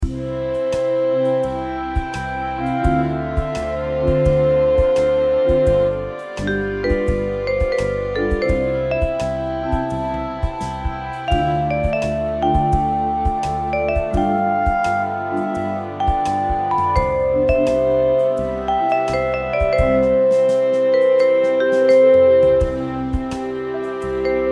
ii V I

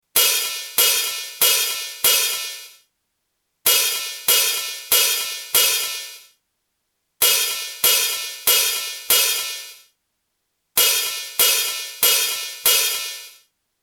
Open Hi Hat 2900Hz; frequency training series

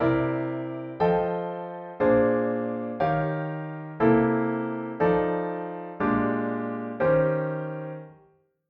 Jazz Chords Dom9 and 13

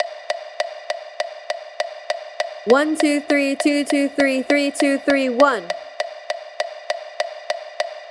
FAST; 9/8 Example 1 Fast

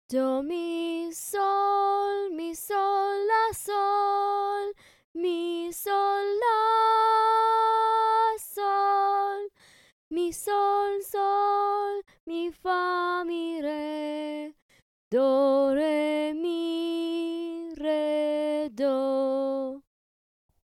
solfa melody

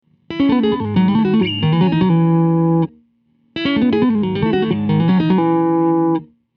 Guitar heavy compression

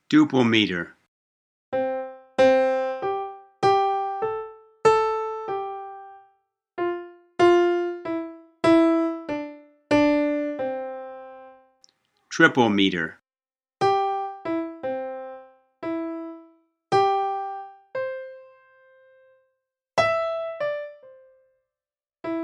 Duple Meter Triple Meter wrong accents

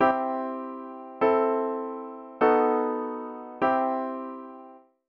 Secondary dominant example

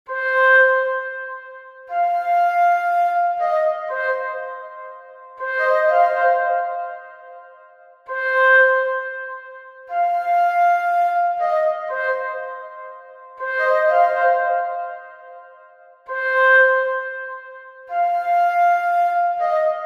Solo Flute; Rhythm quantized (and instrument changed)

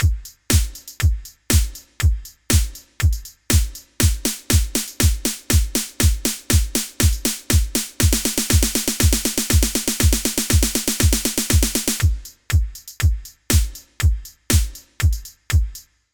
DANCE BASIC FULL; common beats

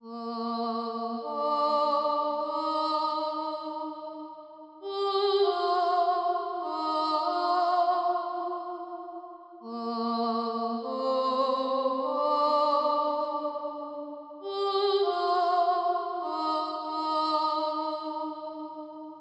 Sight Sing; Sight singing exercise

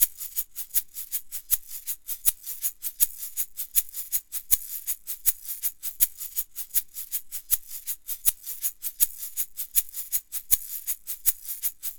Maraca Sample; Latin Music

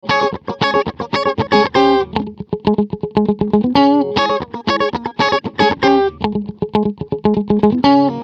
Funk Riff Compressed; Compressors

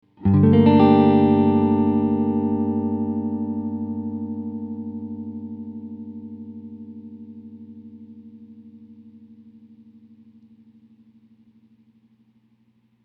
guitar none; Guitar 1a: Gm9 chord, no compression

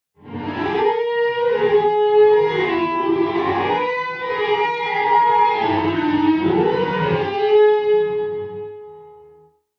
Basic Guitar Reverse Reverb

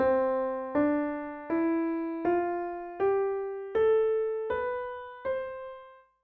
Major scale; Hearing Harmonies

